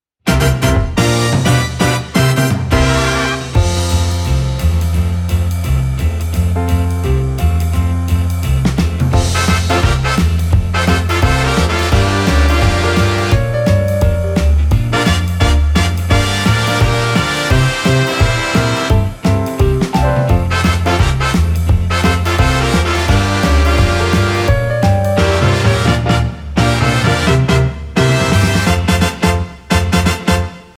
Big Band, 172

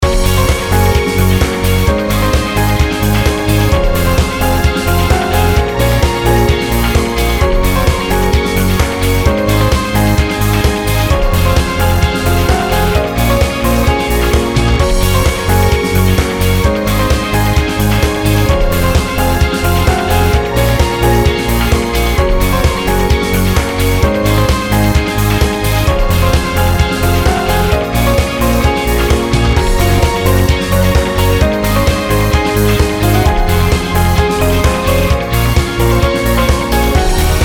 shine long

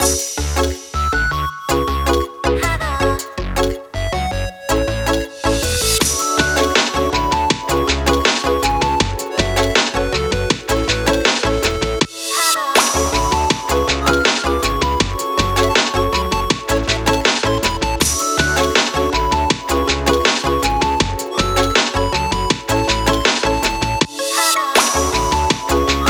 BGM SynthPop 120

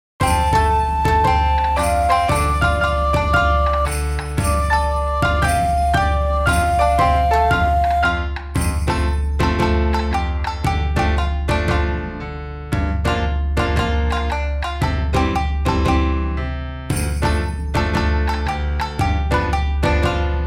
BGM Omatsuri 115

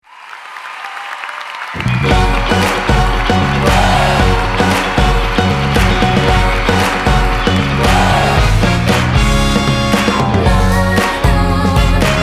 musical, 115

